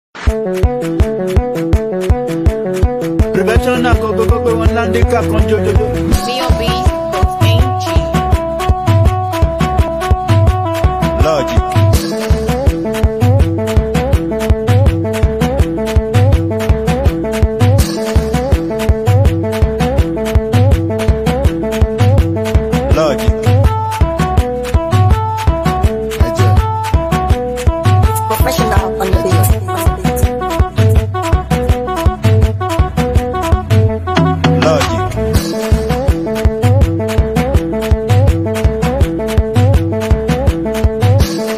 Beat, Afro; Afrobeats instrumental track

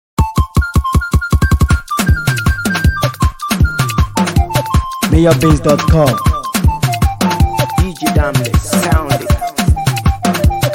Beat

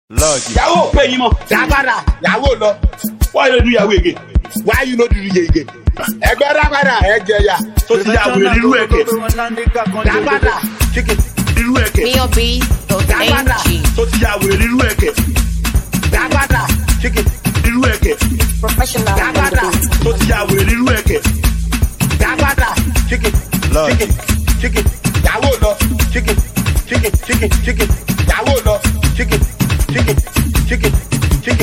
Beat

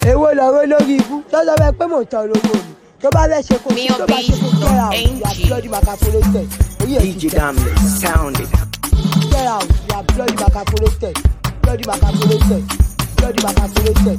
Beat

